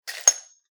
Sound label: pens drop; soundscrate